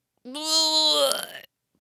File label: Ice Mage Death Vocals